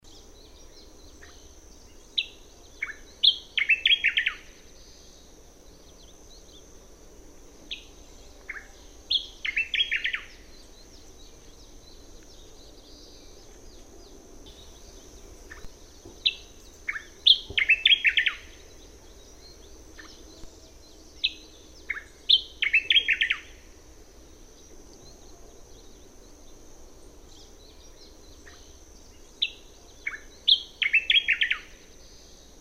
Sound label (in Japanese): ezosennyuu, bird